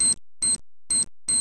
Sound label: keypad; cs chickenrun